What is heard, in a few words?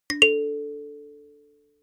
notification kalimba fifth up